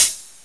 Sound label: cry; Descent